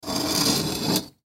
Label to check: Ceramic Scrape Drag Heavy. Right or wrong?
Right